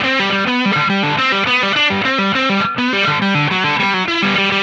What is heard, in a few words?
Distorted Guitar; Stringed Instruments